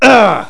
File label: OW